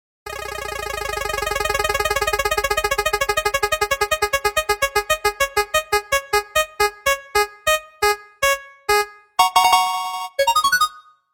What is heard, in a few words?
spin wheel